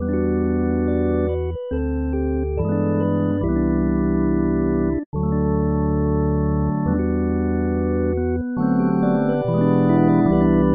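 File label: resonant doubler organ dry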